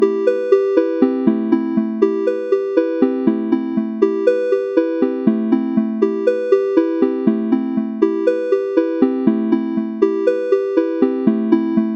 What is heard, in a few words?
Calm Melody Loop